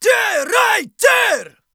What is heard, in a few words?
Battle Cry, Viking Vocal, Un-Processed